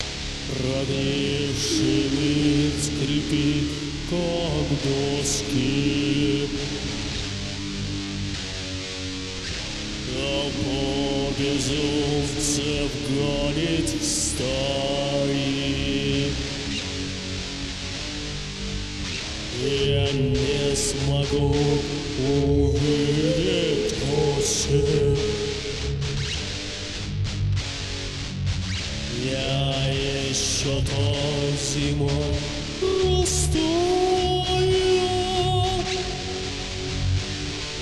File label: sad song